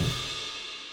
OH

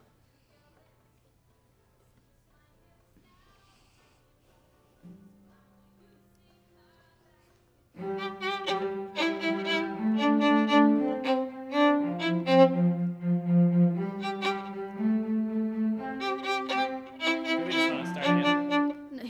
violin

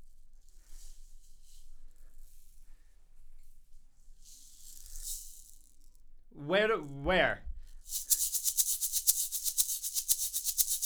Shaker

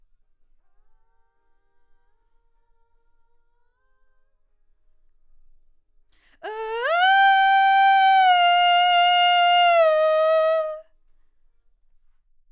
Vox start new

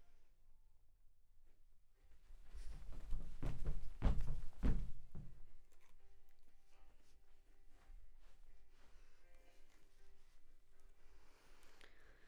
Vox

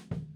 Floor

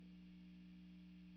GTR; GTR RE20

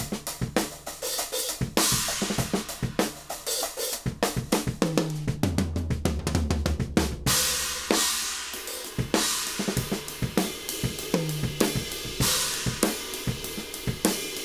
OH

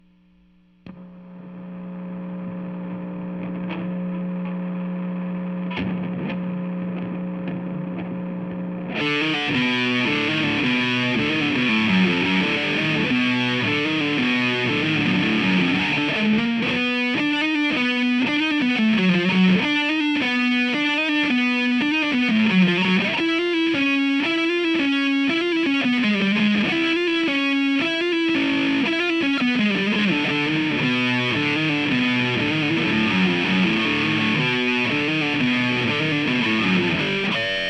GTR

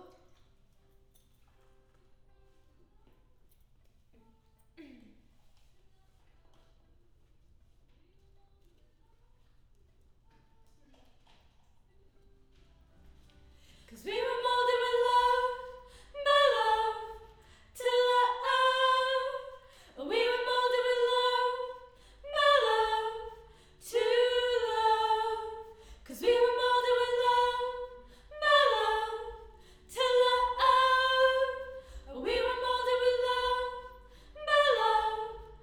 Choir Harm High Mid

